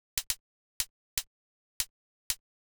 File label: Hat Closed Dirty; hydrogen